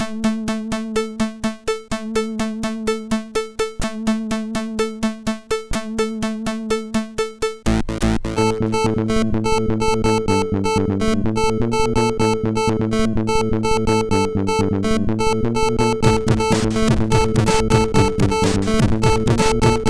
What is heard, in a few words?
Tech, MOS6581R4; sid